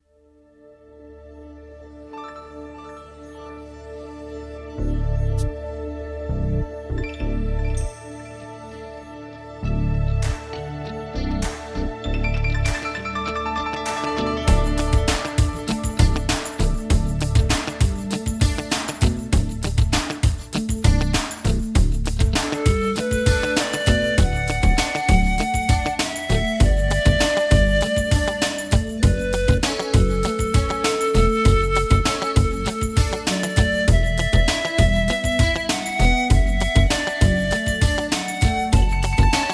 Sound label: Flute Solo